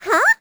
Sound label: hm; Crypt of the NecroDancer